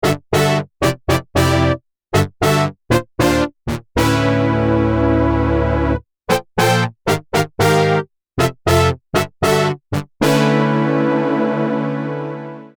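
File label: Pop Brass, synthesizer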